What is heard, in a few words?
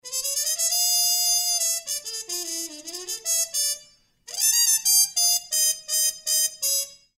aluminum harmon, mutes metal; joral